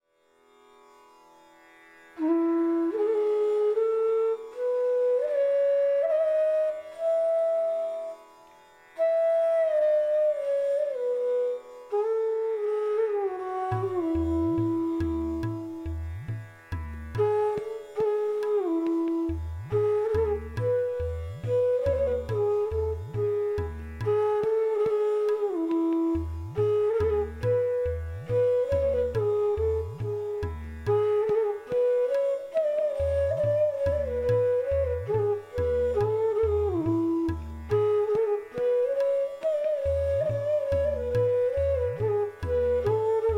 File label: Raga Khamaj Flute; Bansuri Student Zone